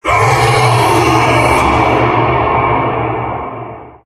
male scream, halloween; Source Engine; tf

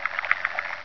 leech alert; source engine; hl1; video games

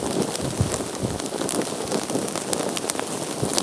fire small loop; ambient; source engine; hl2; video games

